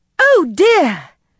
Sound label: Error; kids-tetris